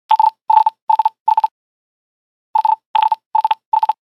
Nokia Buzzer 9210 Frog; Windows Phone apps

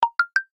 Default SMS tone; ACE Clever-1

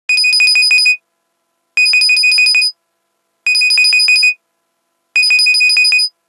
Ring Alerting; HTC Touch Viva WM6.5

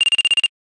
normal calling, ringtones; Fujitsu F-42A

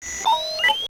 Beep; SKY IM-S400L; Camera; Shutter Sound

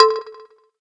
electric, keytone

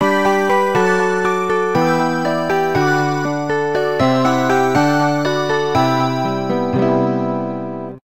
Joy Rhythm, alarms; Fujitsu F-42A

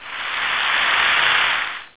Claps; Siemens; Xelibri 8

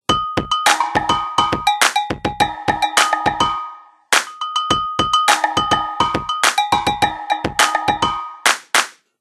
Tasty Beat, ringtones; Microsoft; Windows Mobile; Ring Tone Pack 7

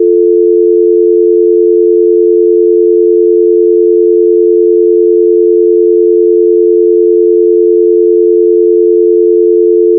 Dial Tone; HTC Ozone

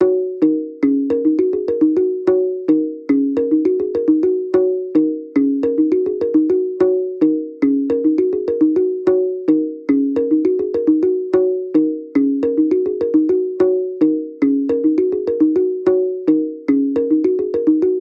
Drum, ringtones; China Telecom

